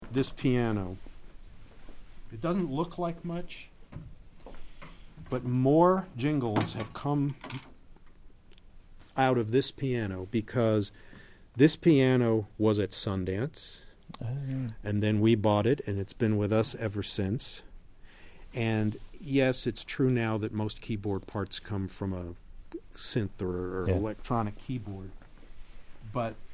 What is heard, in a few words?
Piano